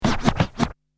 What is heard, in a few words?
insert disk